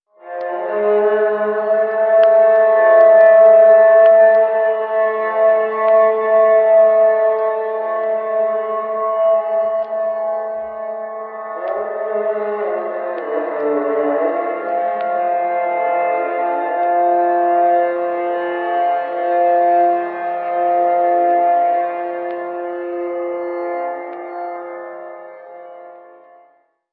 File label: strings circular saw, Sad; Loops